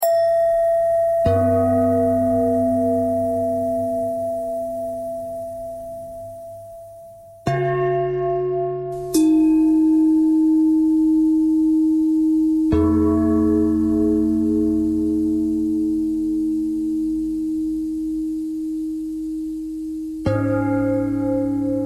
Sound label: bell bell thread noise; bells